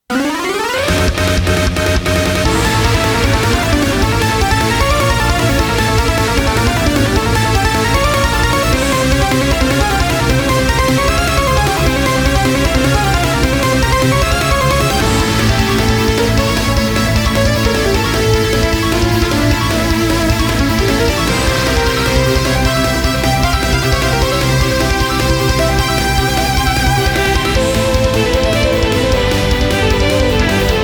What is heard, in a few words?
Battle nemesis loop; Strangers of the Power 2